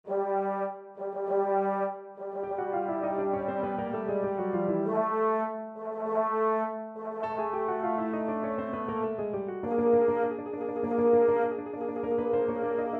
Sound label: Entry of the Gladiators, euphonium